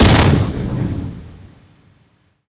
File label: vault prop vanish; props